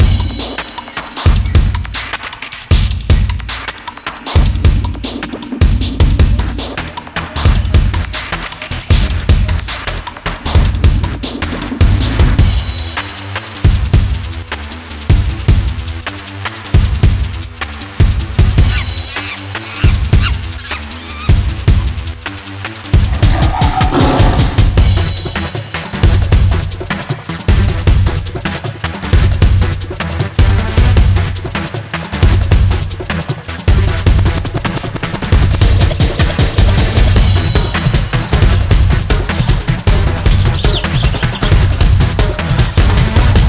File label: Jungle; Hot Wheels Track Attack; Wii